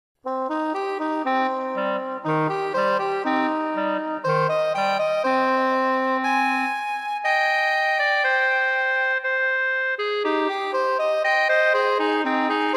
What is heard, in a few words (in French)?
petit duo en re pour clarinette et saxophone